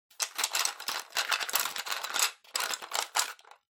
Pencil Case Rummage

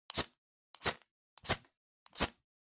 Flint Stick Strike

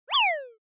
Motion Pop

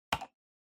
PC Mouse C Hard

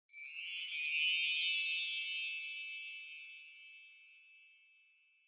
Wind Chimes Reverb Slow Up High

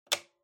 Calculator Switch

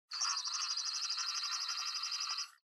Portable Stereo Driving Noise CD Seek

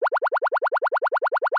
Motion Pop

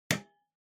TV Hardware Switch

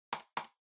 PC Mouse Far L Hard Double

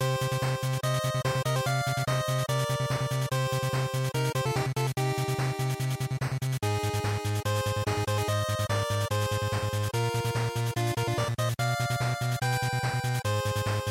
GB Action Stage5 Loop145

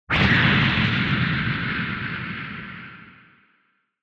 Retro Anime Explosion High

